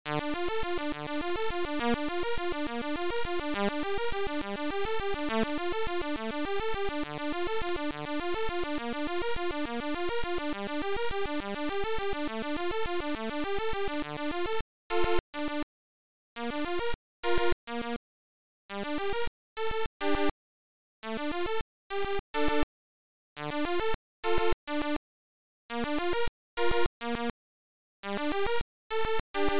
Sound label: Guitar Arp